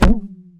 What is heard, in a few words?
Bounce Material; angry birds rio